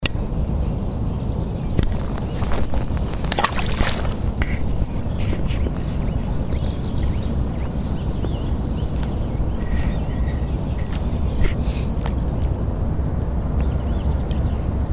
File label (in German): seegeraeusche